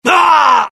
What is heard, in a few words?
death; mohaa; dialogue